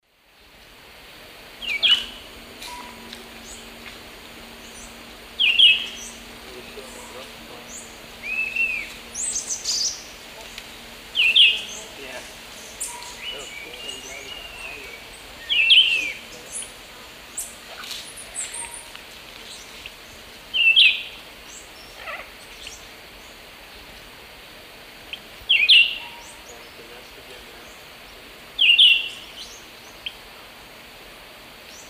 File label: Vireo olivaceus, song; S. America; Eastern Lowlands